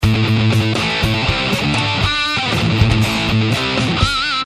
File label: Heavy DS1 JCM900